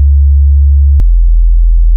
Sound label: game over; Wackelpeter